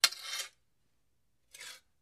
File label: Pick Up Sword Grab; swords